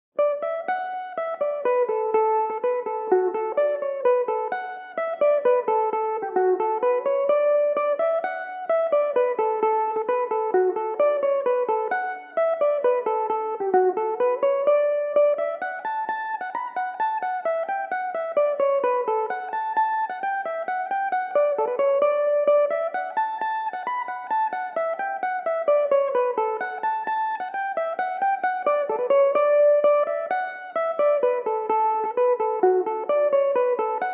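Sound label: polka D major belmuse, irish mandolin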